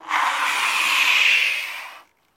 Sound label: Bike Tire Slide Skid